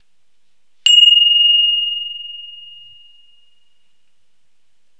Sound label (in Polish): DZWON